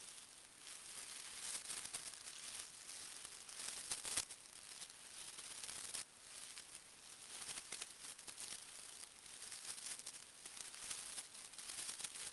rustle studio performed synthetic brush hairs shuffle loop; sonniss gdc